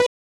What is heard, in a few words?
Countdown